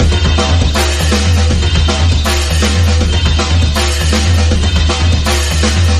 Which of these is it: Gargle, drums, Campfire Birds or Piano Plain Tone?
drums